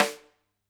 Lud Rim, drum

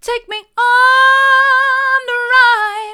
take me on a ride mid, vocal

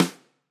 Snr, drum

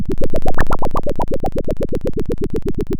Sound of a thunderstorm, center

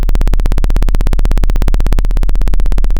Sound of a plane taking off

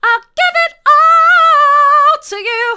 hard, vocal

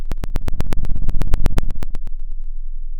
Sound of a fire crackling

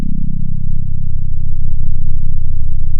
pop, sound of a small motorcycle

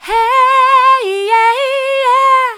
hey yer soft, vocal

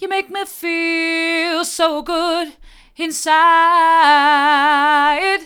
hard, vocal

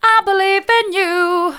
i believe in you mid, vocal

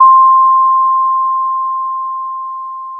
Sound of a school bell

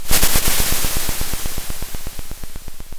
Sound of a drum roll

